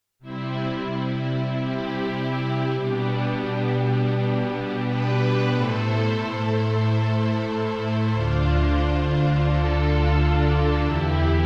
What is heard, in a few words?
Strings; Pianobox